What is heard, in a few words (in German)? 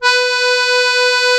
AKK VIOLIN, akkordeon